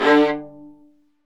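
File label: Marcato, Strings; Vlas Marcato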